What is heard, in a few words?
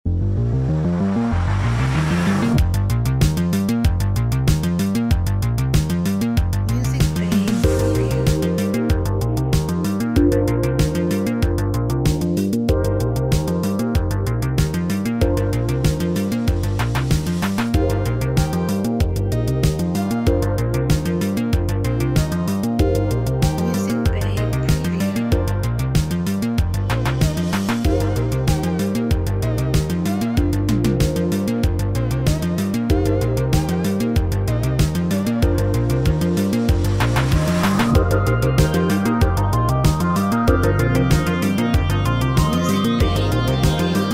Retro Future 80s Synthwave